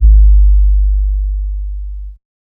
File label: Bass, Dubstep 808 Basses; GhostHack